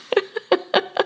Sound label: hahahahaje